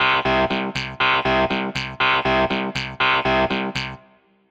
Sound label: cycling effects